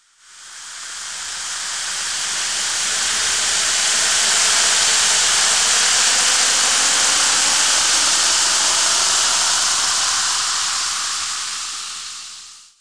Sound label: Antacid Tablets; City Life